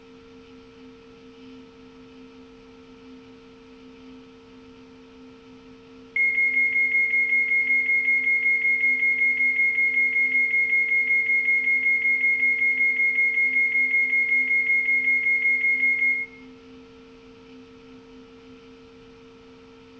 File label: alarm novelty night